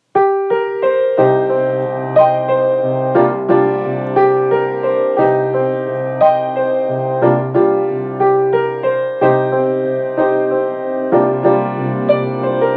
Gaveau Sheraton, piano

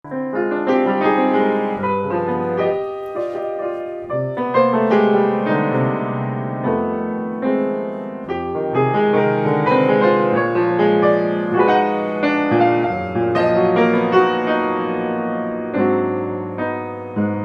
STEINWAY O

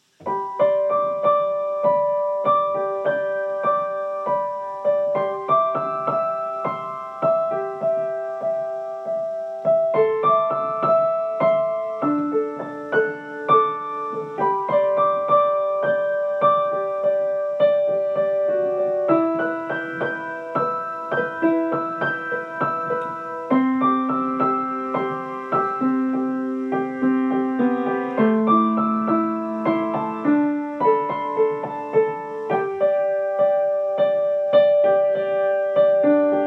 Pleyel

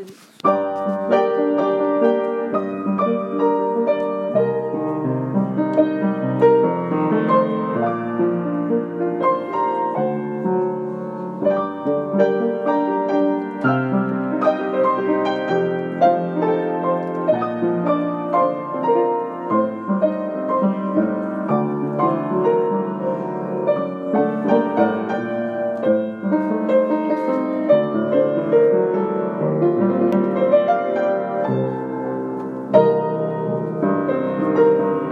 Sauer, piano

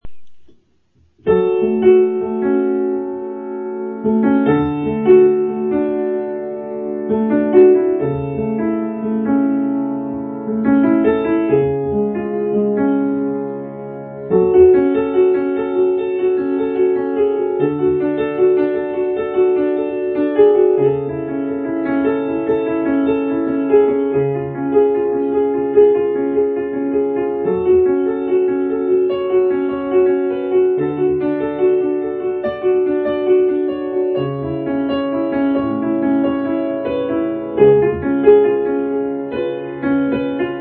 Young Chang, piano

